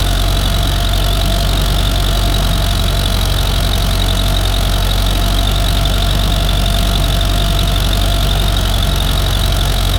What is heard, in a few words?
two naturally aspirated, engine